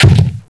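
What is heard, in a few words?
m41a grenade launcher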